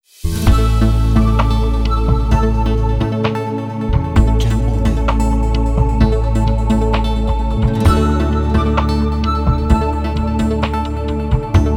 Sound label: Ambient Background